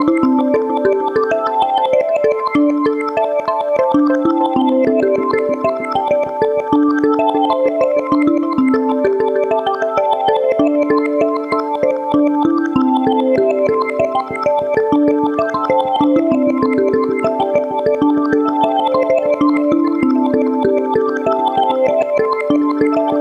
marimbas

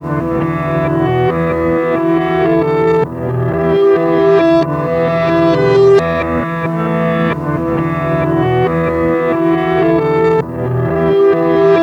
tape loop

